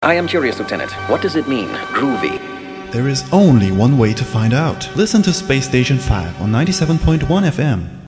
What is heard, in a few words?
groovy; hal2001; spacestation5